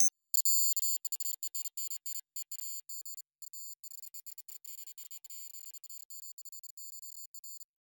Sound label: computer